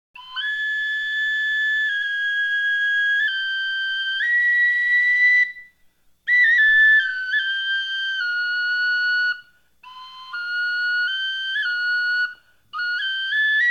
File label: solo